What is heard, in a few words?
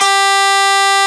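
Overdrive Guitar G2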